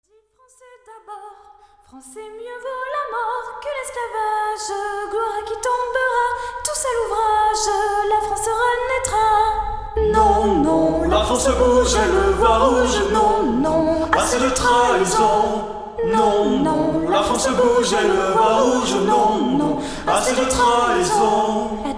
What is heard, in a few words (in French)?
chant